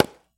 chop, lumbering; 0ad; resource lumbering

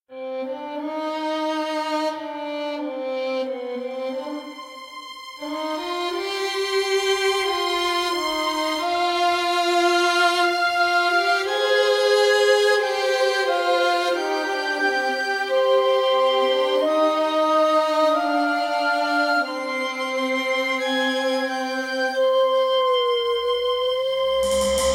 loss, music; 0ad

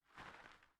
hoe, farming; 0ad

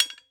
sling impact metal; attack impact; 0ad

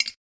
arrow impact metal; attack impact; 0ad

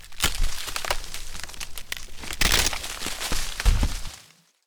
tree fall; 0ad; resource lumbering

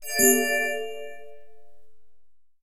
alarm create priest; 0ad; interface

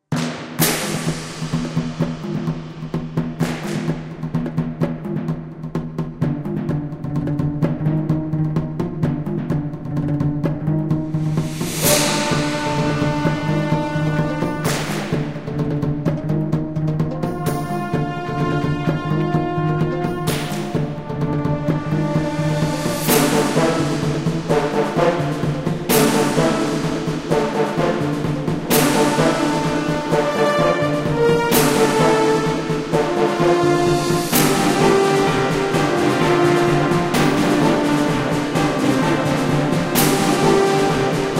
persian battle, music; 0ad

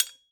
sling impact metal; attack impact; 0ad

